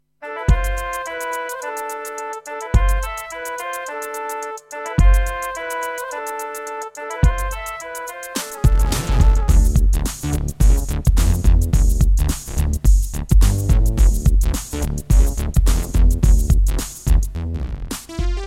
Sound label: EasyMIDI EM-100; Lost Vikings